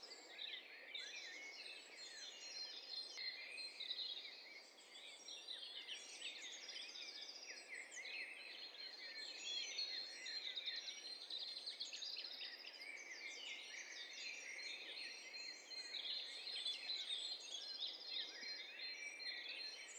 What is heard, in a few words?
STEREO BIRD COCKTAIL PARTY